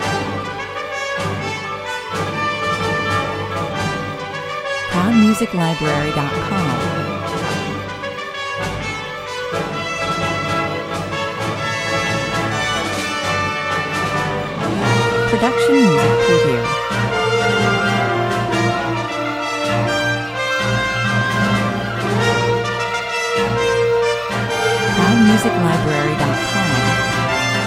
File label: heroic quest (big finish), 130 bpm, recorded with a live orchestra